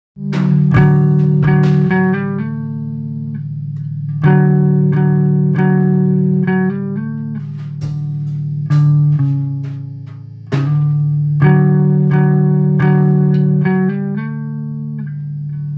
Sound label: drums b minor